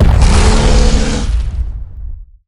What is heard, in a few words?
earthshock; ursa